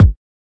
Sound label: kik